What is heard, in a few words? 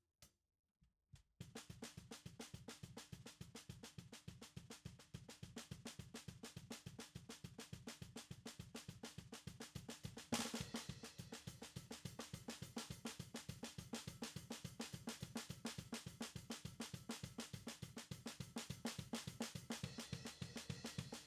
SNR BTM